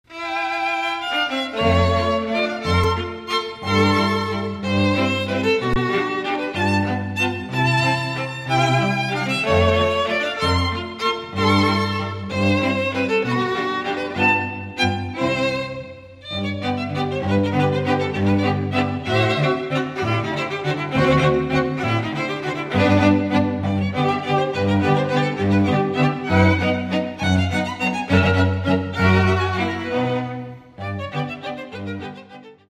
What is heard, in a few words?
waltz